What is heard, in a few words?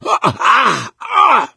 hurt major, gambler, survivor voice